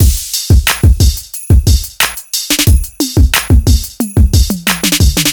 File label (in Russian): сэмплы битов хип хоп